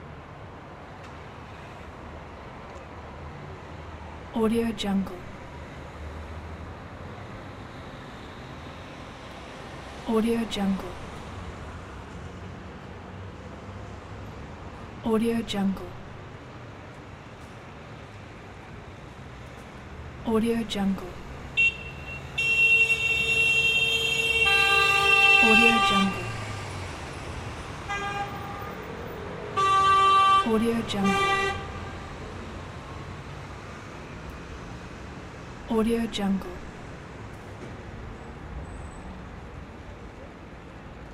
ambiance traffic busy above perspective udine loop